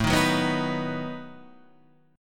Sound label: B-Major A